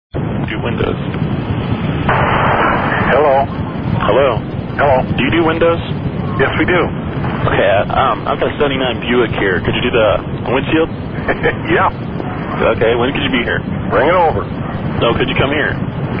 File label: window washer